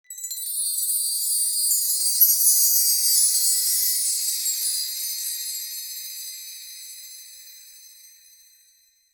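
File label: a single bright shimmering, magical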